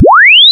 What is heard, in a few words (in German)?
ton; physik; akustik